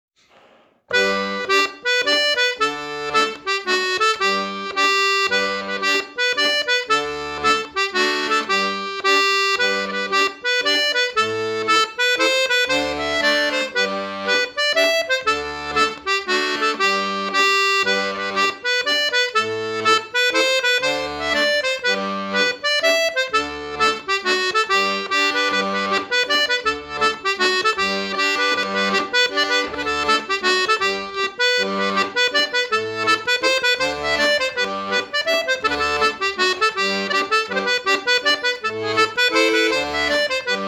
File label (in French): Gavotte Ton Double